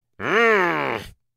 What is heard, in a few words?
angry grumpy sound effect